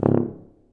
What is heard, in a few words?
C TUBA, stacc; Roland L-CDX-03 Disk 2; BRS Tuba